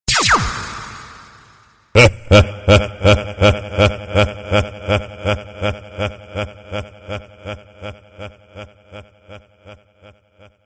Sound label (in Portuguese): Espada com risada